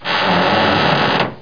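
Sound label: door creak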